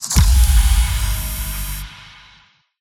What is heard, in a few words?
Generic UI Cosmetic Level Up; Eximius: Seize the Frontline